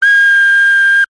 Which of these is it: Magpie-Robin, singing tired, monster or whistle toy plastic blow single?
whistle toy plastic blow single